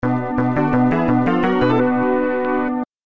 Suspect Caught; Where in Time Is Carmen Sandiego; SNES

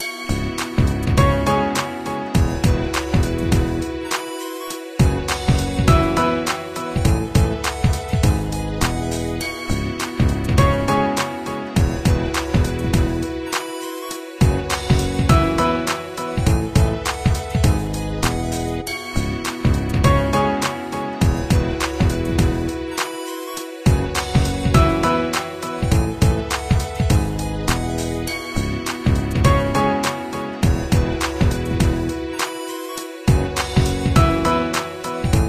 Halftime Theme; Kobe Bryant Pro Basketball 2008

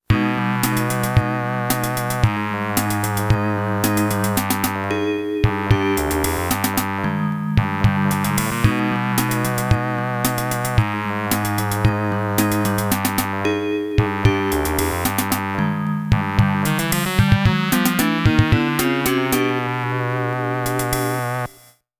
FM Sound - Opening; Monster World Complete Collection; Monster World II

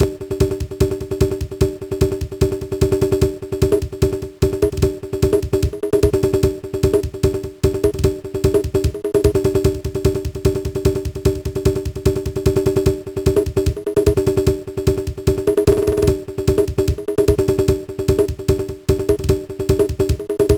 Drums (Invincibility); crash bandicoot 2 n tranced

